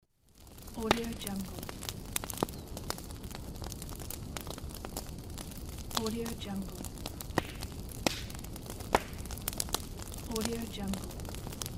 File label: fireplace